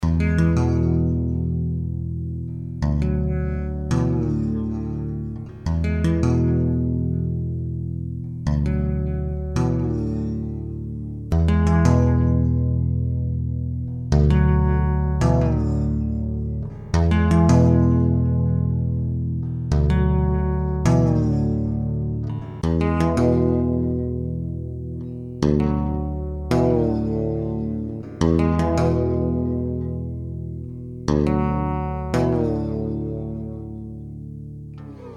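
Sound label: round Wound EQ High Boost, bass; Ltd; Beide PU; nur Hals PU; nur Bridge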